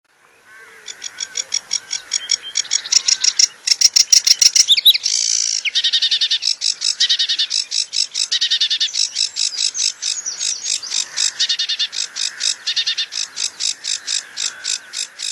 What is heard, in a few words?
sedge